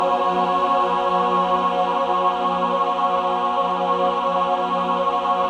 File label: SUS F#4, SUS.CHORD, Symphony of Voices